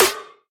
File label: snare